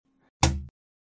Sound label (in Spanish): Slap (sonido)